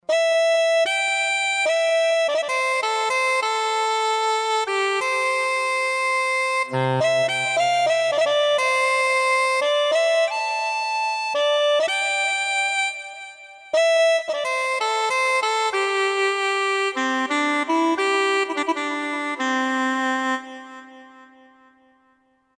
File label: Uillean Pipe, Roland SR-JV80-16 Orchestral II